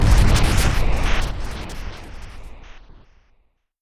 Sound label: Impact; Dino Run